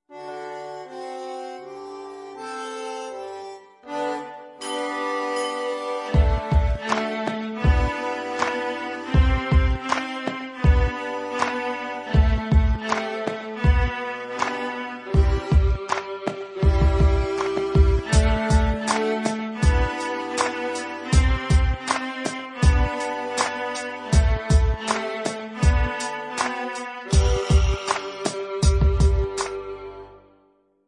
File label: G string stomp, viola; Hey Presto for viola bk 1 bronze